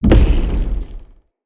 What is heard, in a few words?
Staff Caster Cast Short Shot, Weapons; Player; Staves; Galene